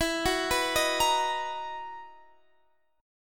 B Major 7th E; BM7/E; arpeggiated

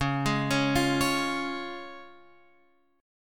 Db Major Db Guitar Open F; arpeggiated; JGuitar

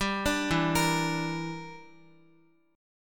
E Minor 7th Flat 5th, Guitar; arpeggiated; Em7b5; x,x,5,7,4,5; 4E,4A,5D,5G,5C,6F

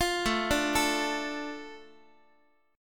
A Suspended 4th Sharp 5th A down Guitar, chord; Asus4#5 arpeggiated; JGuitar

